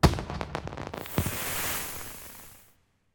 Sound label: Celebration Fireworks